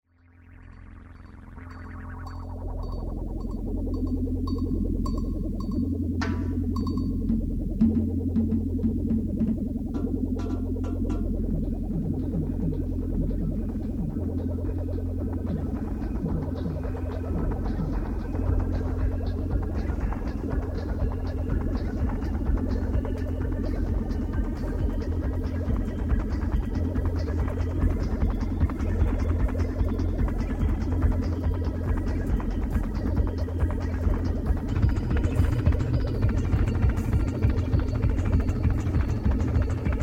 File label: noise